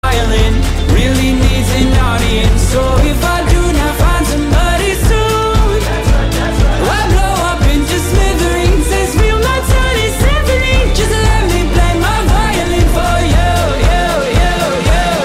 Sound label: Smallest Violin